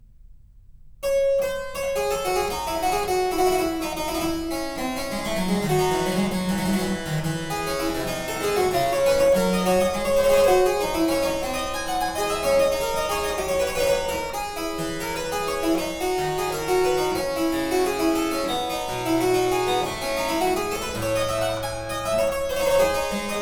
Suite In D Minor, Prelude, Harpsichord; Suite No.7; Prelude (Alternative); Purcell edition

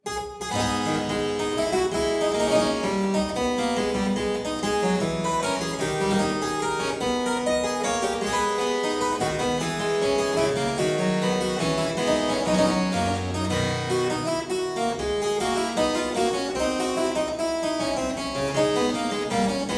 Suite in A minor Courante, Harpsichord; Buxtehude; Complete Harpsichord Music; BuxWV deest

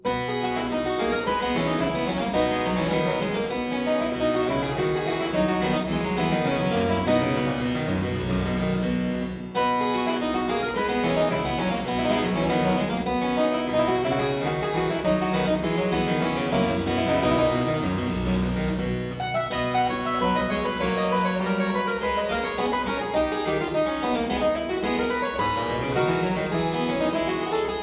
Aria in C major - Variatio, harpsichord; Buxtehude; Complete Harpsichord Music; Aria 'More Palatino'; BuxWV247; Variatio 3

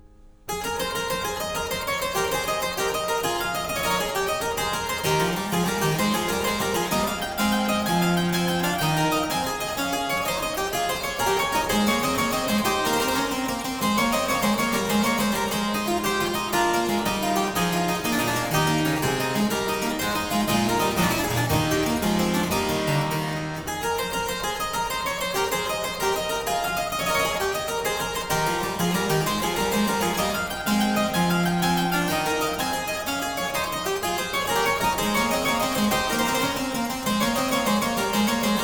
Suite in A Major IV. Gigue, Harpsichord

